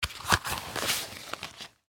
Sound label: mask off; bag